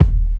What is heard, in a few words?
2000s subby easy listening steel kick drum d sharp; modern kick drum